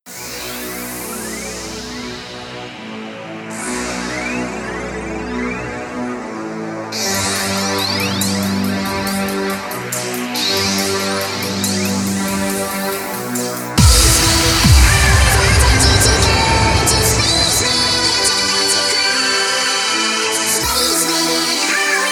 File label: dubstep remix